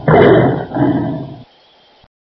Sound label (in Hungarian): oroszlan